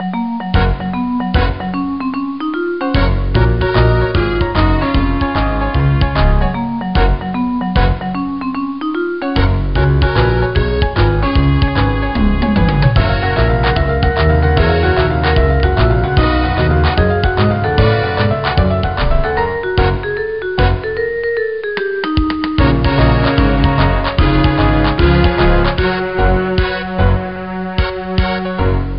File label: Instrumental